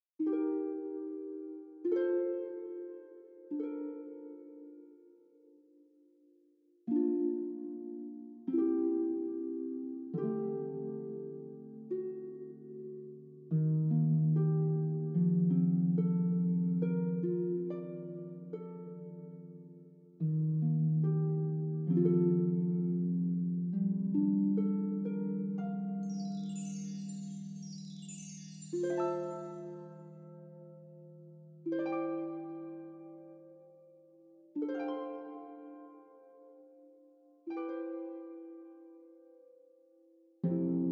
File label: dance karaoke